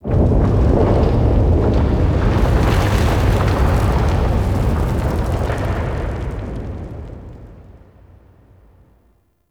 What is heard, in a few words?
Head Temple Interaction, Ambience; Duviri; Warframe Interaction